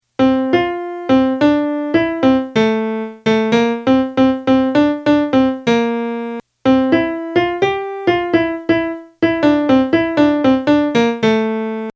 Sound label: melody